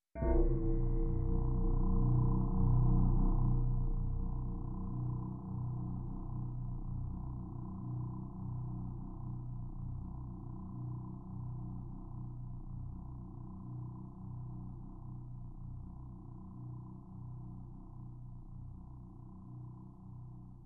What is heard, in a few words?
Aggressive growling scary drone